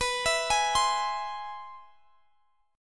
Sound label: C Augmented Major 7th B